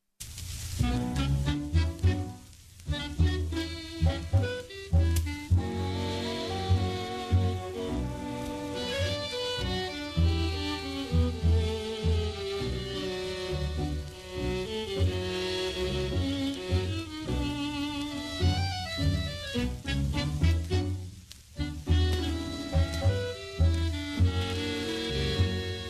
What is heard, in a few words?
Ukrainian tango